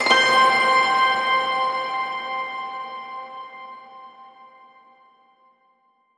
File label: Twinkle; North Star